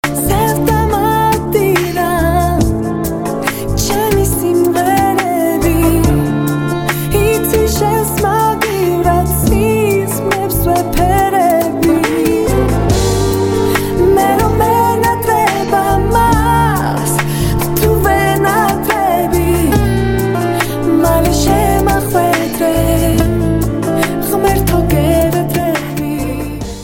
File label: Georgian Sad